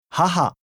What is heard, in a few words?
haha